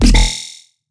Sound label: Bass E Robo; Dubstep; Bass One Shots; Ghosthack; Dubstep Sound Arsenal; 50 Bass One Shots